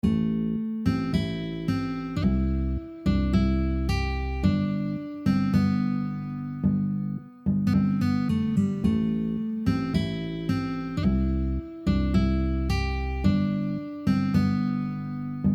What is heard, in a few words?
riff